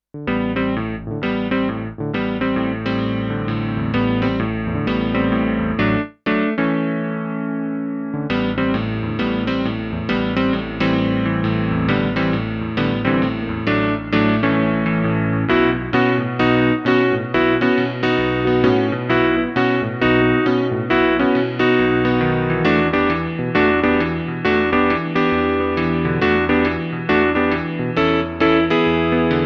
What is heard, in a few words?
Strymon Big Sky Room Piano